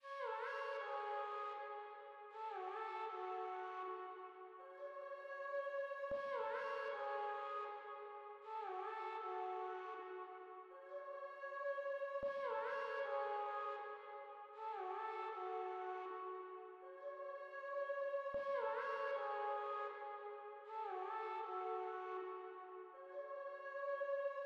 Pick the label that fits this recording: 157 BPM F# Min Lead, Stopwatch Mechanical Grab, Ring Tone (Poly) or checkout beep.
157 BPM F# Min Lead